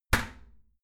toilet lid close regular; haus-garten; badezimmer

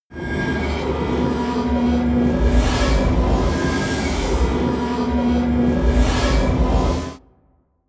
promo pad

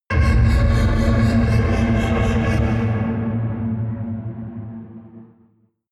flanger flutter high; whooshes rises; hits impacts

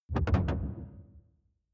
sci-fi alien wobble slow; swooshes-swishes

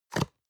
box small close hard; industrie wirtschaft; bueroarbeit

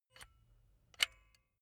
silicone gun bullet lock mechanism soft; berufe; handwerker; soundpool

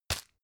tissue grab hard; objekte; taschentuch

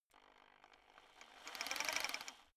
rock drill chisel slow start small; schrauben drehen hydraulik

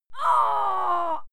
female fight groan pain; schreie; menschen

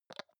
hygiene small cup open soft; haus-garten; badezimmer

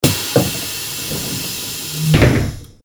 bus door closing

